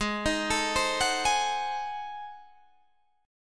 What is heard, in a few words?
Ab 7th Flat 9th Guitar Standard, chord; Ab7b9 arpeggiated; JGuitar